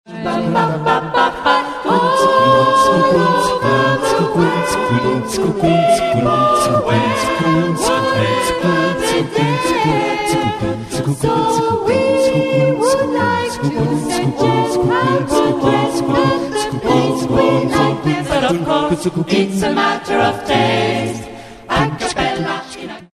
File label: live acappella